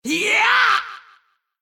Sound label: attack; Bad Brawler